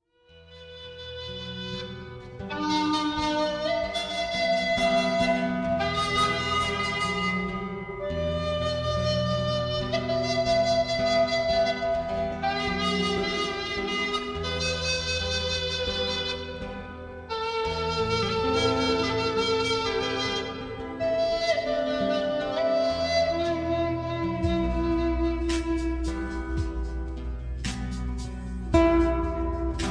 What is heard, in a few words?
Ocean Breeze lullaby